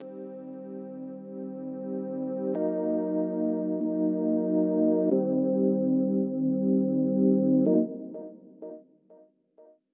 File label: Swell Pad